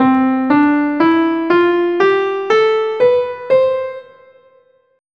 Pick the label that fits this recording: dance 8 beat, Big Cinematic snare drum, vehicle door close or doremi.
doremi